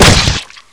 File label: fire; gearbox; agrunt